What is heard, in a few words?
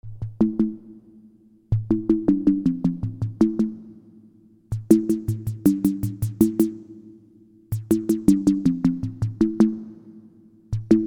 Mini Key Drums